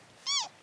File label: C elaphus sibiricus